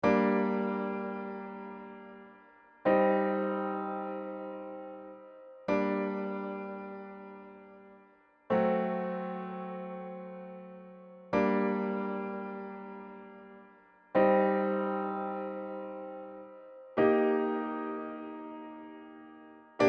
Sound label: Rhythm Solo Exercise Chords